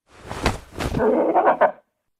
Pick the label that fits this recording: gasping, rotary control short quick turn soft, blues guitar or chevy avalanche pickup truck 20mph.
gasping